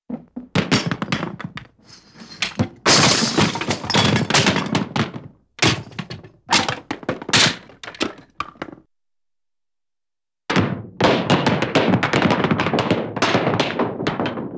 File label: Circular Items Roll Off Counter & Crash Fall Tumble, cartoon; Hollywood Edge; Cartoon Trax